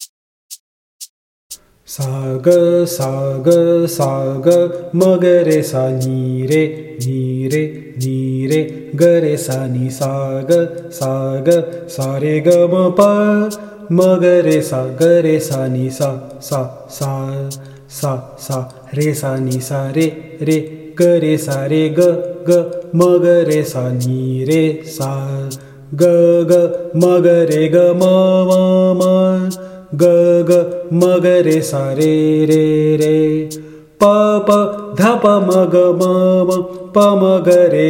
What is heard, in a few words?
Band music vocal; Bansuri Student Zone